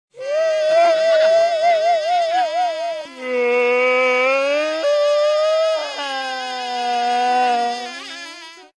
crying; tmkoc; popatlal; audio meme

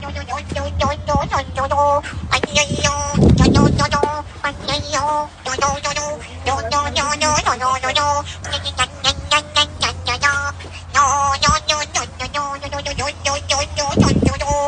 rave; altai; green screen; audio meme

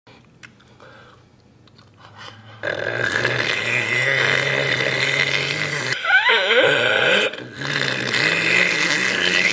cat hissing; cosplay; audio meme

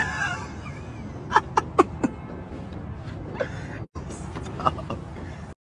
laugh; leslie jones and draks; audio meme

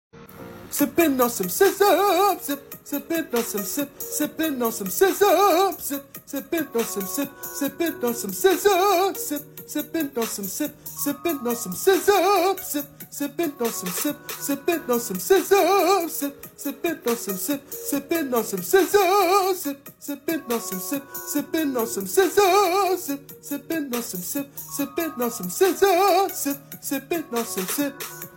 singing; audio meme

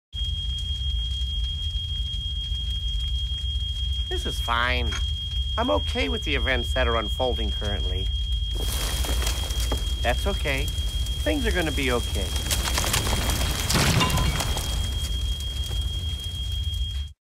dog in burning house; audio meme

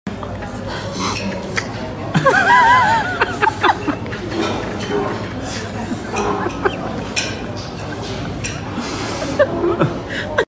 hungry child; audio meme

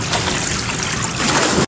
pool dive; audio meme

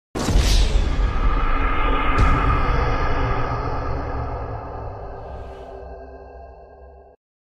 mission failed; gta; audio meme

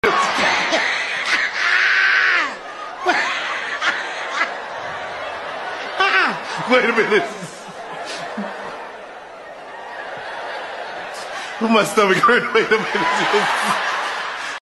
laughing so hard; audio meme

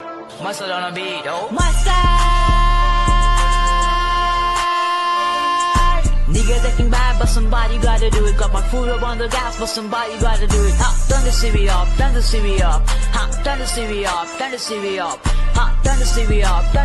singing mustard; green screen audio meme

